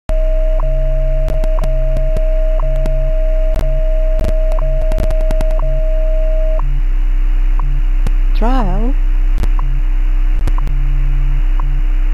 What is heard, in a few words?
utility time signal wwv 20000khz